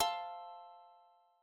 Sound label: chord